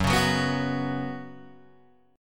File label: F sharp Suspended 4th, Guitar, Open G